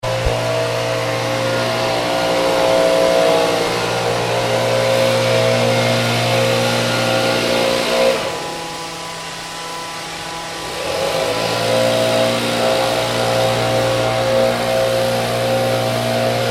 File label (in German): Laub Blaeser